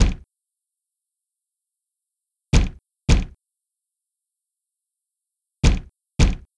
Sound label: DOOR POUNDING; fnaf; fnaf1